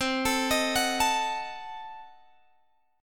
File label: C Diminished 7th C, Guitar Open G